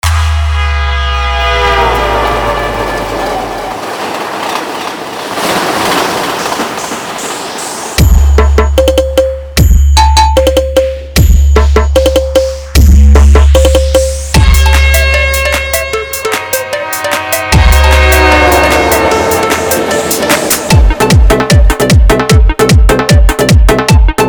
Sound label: Electronic Midi Instrumental Vaibration Hard Mix; Danger Train Music 2018